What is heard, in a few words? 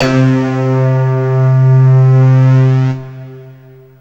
BELL SYN; SoundFactory; SMPMANIA